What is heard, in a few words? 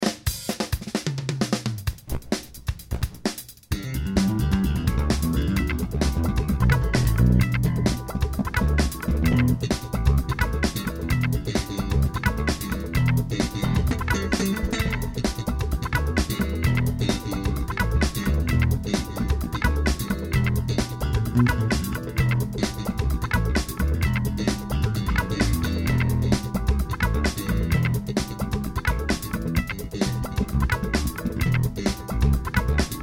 Bass Line; Áquila Sans